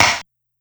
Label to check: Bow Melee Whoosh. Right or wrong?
Wrong